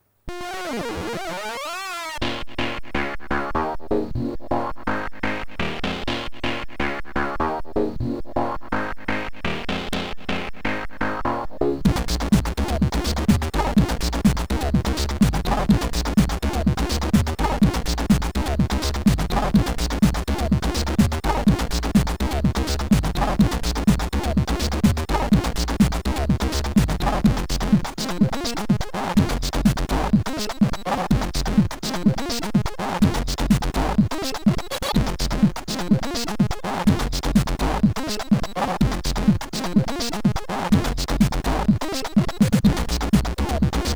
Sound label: sid jammer clubstyle; sidv2